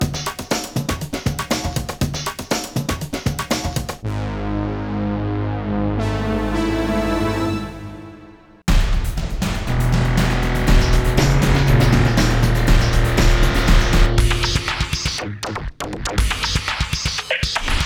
first fight music; Ben 10 Protector of Earth; Wii

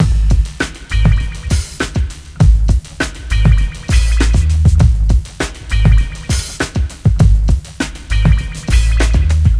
Ditty; NBA Inside Drive 2003; Xbox

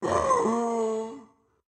Pain Crazy; Going Under; Caffiend Caelan

